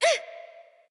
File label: NV Ouch; smite; awilix